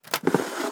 case Remove Non Metal

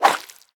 Brain Throw

